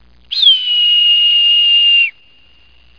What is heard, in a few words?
redtail